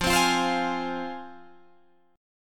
F Minor F down Guitar Open G

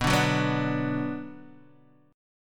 B Minor B Guitar Open D

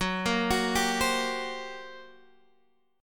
G Minor Major 7th Double Flat 5th Gb

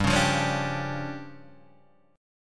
D Minor Major 7th Double Flat 5th, G

